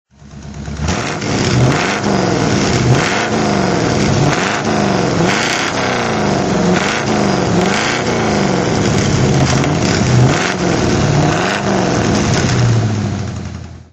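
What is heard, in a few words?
buick electra225 throttle, Engine sound; Buick Electra 225 convertible, 1960; V3D/ ClassicarImages; Marc Vorgers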